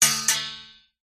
metal spring ping tight; foley; zapsplat

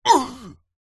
human male vocalisation pain short ugghh; zapsplat

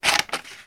office scissors cut paper sugar single; sound effects one

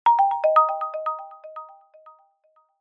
multimedia alert system

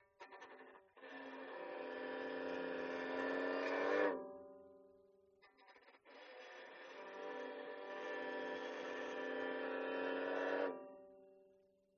violin long bows dynamic; aston stealth

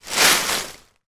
foley leaves dried autumn many movement hands; zapsplat

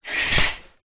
household shower curtain pull

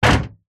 impact glass loose panel

